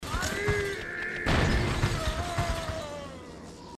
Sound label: long death